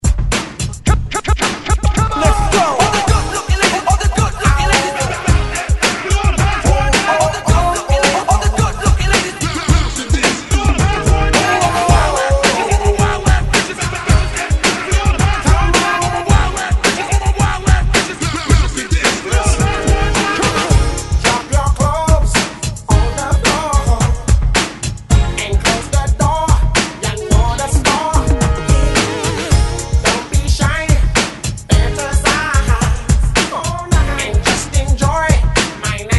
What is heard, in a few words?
Hype Re Drum 109; DJDOLLS